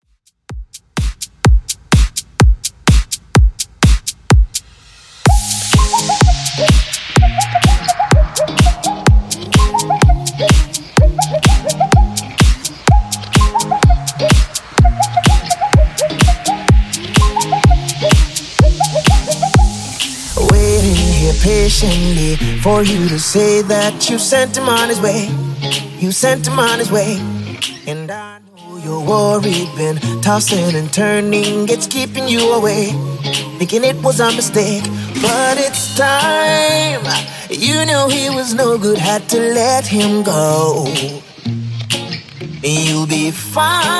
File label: Top40 Simple Redrum 126 bpm